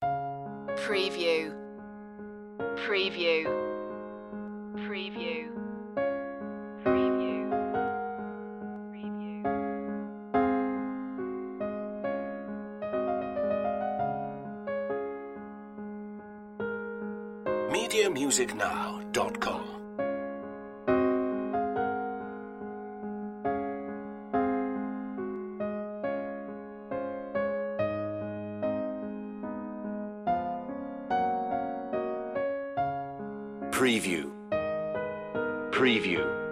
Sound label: raindrop prelude